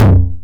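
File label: 909 high tom tape saturation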